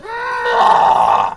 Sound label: sick man